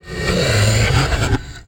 Wizard

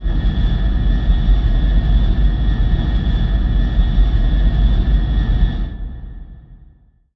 fire; RYL 2 v1673

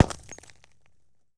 Bullet Into Windshield

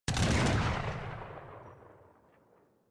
Muskets; Age of Empires III; cinematics

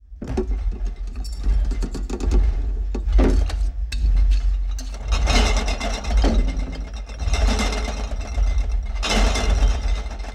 Footsteps With Shaking, Monster; Sony Pictures Sound Effects Series

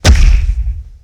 Mega Face Punch, hand to hand combat; Sony Pictures Sound Effects Series

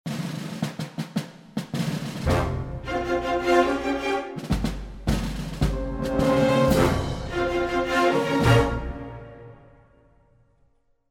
Music

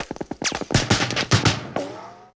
Machine Gun Rico Multiple; Sony Pictures Sound Effects Series; Ricochets - Hits - Whiz Bys

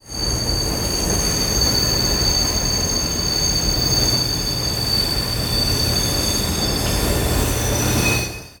train brakes; Sony Pictures Sound Effects Series; Vehicles; Trains

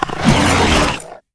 swolf attack

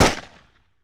Pistol Shot With Echo; Weapons & Firearms; Sony Pictures Sound Effects Series

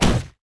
bow hit; RYL 2

